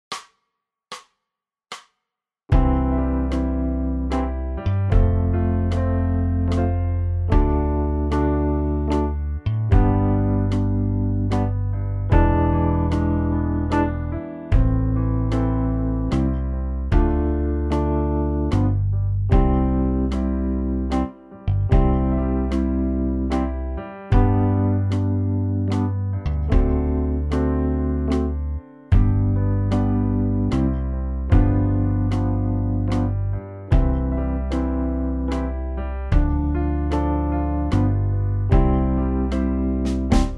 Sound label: we wish slower; xmas